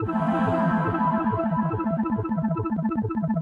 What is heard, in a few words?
SPACE SYNTH 140 A; SpaceSounds